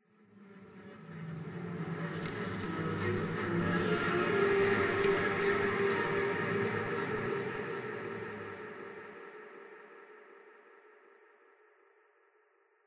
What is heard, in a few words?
Interior Reverses; The Sinking City